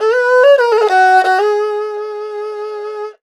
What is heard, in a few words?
ERHU LICKS; Best Service ProSamples vol.52 - World Instruments 2; AKAI